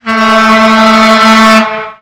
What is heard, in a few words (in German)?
D Horn 2 lang